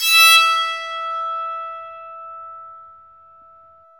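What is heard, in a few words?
bowed psaltery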